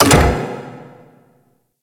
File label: Gas Robot Repair Stop; Ambience; Props; Warframe; Corpus Gas City